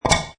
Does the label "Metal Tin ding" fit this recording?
Yes